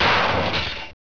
crash, mad cars

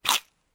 human eat celery

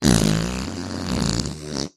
fart

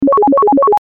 powerup

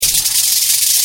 snake snd; survival

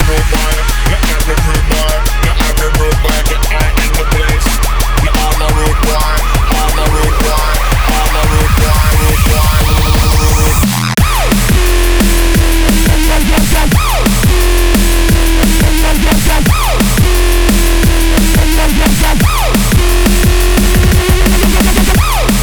DNB TING

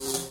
sfx light flicker

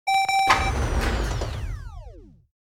lift off; coolmathgames

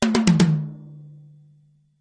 chain; tenaPuyo

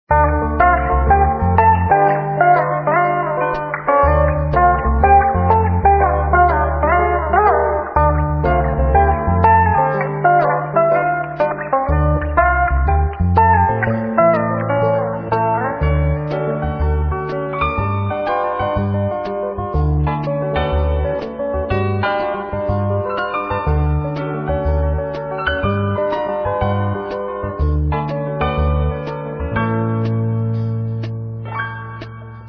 music; triadz; shockwave

